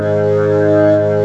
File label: B3 G#1; Best Service; Advanced Media Trax Modern Composer; B3 SMALL1